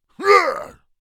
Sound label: Orc creature fighting vocalization